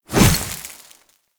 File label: Hover Whizzby Angry; Enemies; Warframe; Warframes; Pax Duviricus